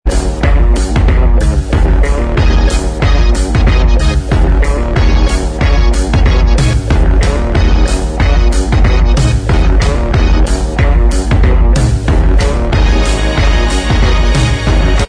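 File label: instrumental, backing tracks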